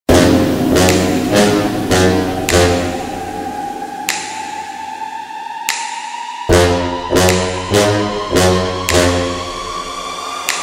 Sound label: trap